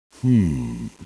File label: hmmm